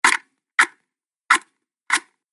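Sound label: hookah sounds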